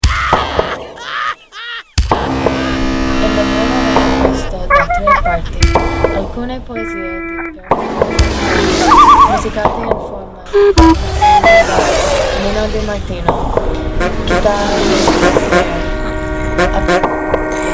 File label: noise